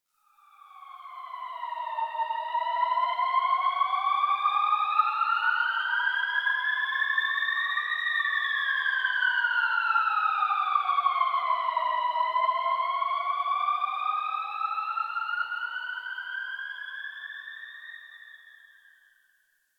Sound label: Tunnel Distant Siren; Warframe; Ambience; Nineteen Ninety Nine